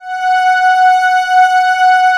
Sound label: Atmo Pads; Best Service; XX Large